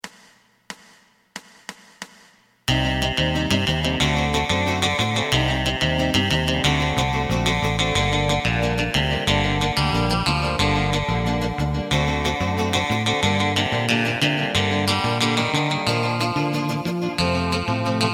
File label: drum